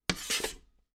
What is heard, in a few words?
Metal; TOOLKIT vol.2